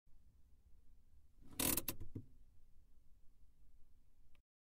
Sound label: Car handbrake